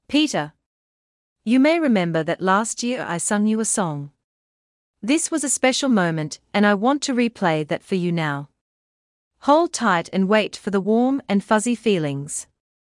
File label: Singing